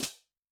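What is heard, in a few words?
Cymbal Closed; Yamaha DTXpress-II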